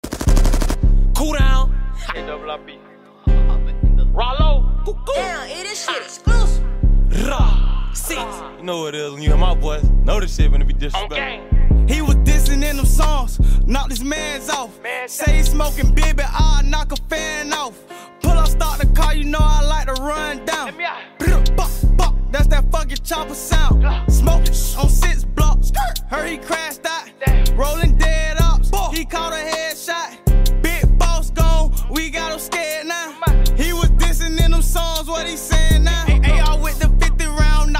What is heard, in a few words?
Beatbox